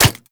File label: Nunchaku Catch; Weapons; Warframe; Tenno